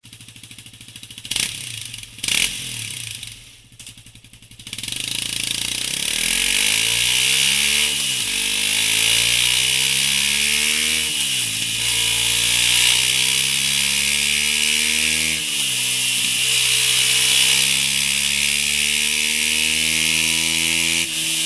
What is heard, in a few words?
HONDA CBR 250R OVAL